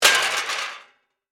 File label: Crash Metal Object, Crash, Metal, Objects; Crashes; Blast Wave